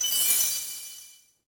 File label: menu fade out; Pump It Up Exceed